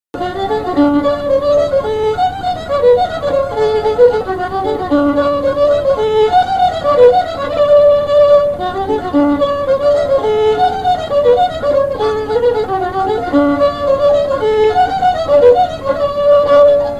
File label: fiddle; west end